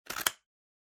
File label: Scissors Cut Paper